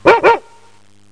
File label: DOG